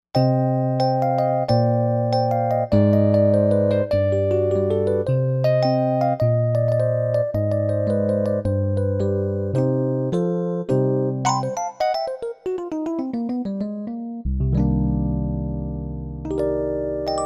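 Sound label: Piano Early Tines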